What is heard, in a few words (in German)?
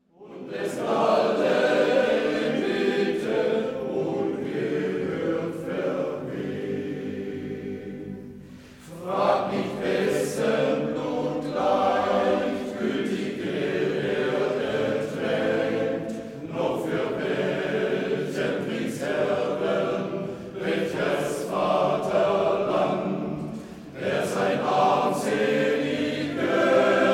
der wind geht allezeit coro